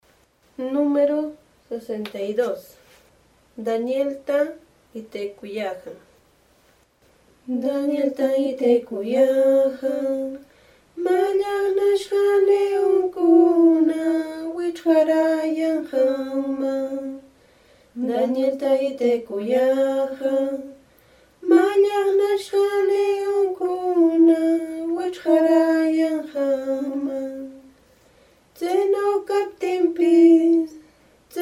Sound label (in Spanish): cancion